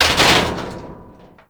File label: Military Checkpoint Metal Hit